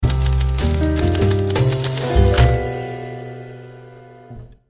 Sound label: newsroom intro